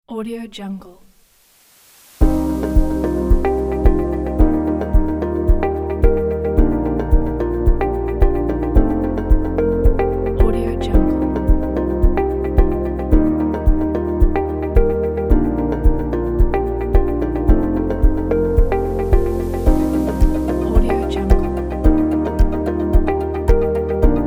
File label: ambient corporate background